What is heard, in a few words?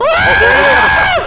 wildcat scream